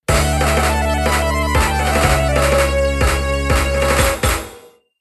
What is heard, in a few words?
GAME OVER - ARRANGE VERSION; Steel Empire